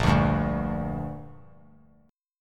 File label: A sharp 6th; strummed; JGuitar